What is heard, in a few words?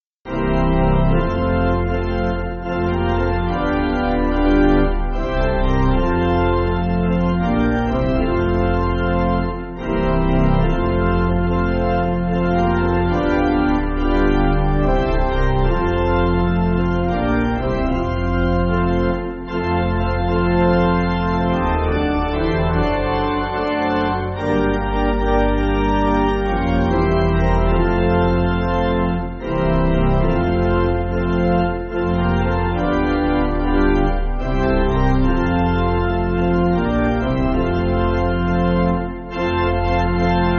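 Zulu Melody, Pipe, Pipe Organ, Backing Files for Churches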